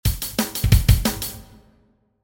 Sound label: beat